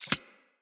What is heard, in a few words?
Arachnoid Micro Step; Enemies; Corpus